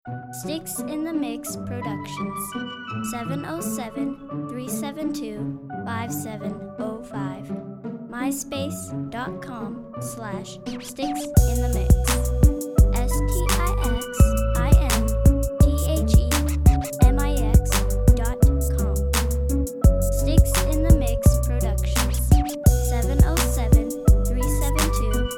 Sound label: pizzicatos